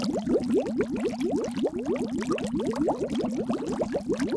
Bubble Loop

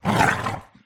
Dog Attack

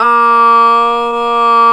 Oohh

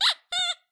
Rubber toy

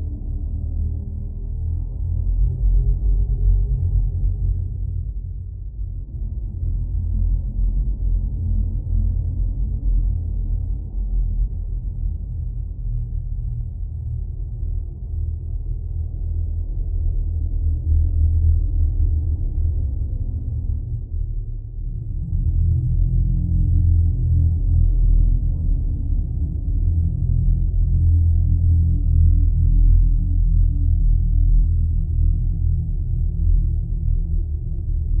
Demonic Ambience

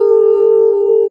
Wowozela

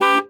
Carhorn

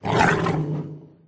Dog Attack

